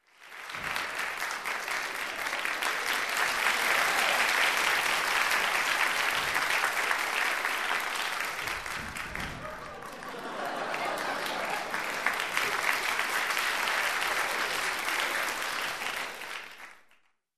Warm Applause and Cheer

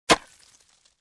Landing axe swing on wooden log

